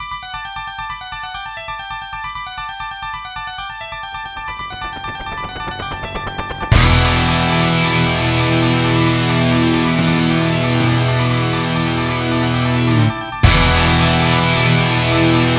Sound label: midi; Silent Spring